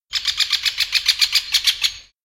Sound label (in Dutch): vogel